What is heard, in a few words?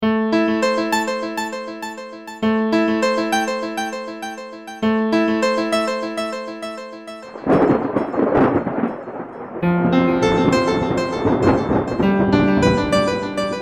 rain piano breakbeat version